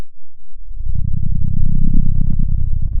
pop, Sound of a dinosaur

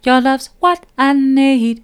soft, vocal

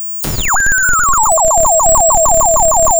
pop

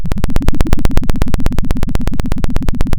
pop, Sound of a helicopter

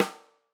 Tam Rim, drum

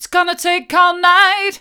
its gonna take all night hard, vocal

